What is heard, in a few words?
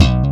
Pop Slide C#0; Digital Sound Factory Xtreme Lead